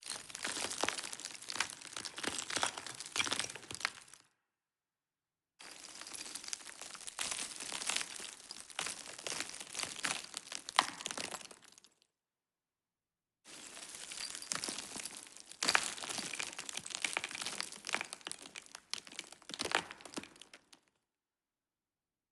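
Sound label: dirt crash stone sand trickle reverberant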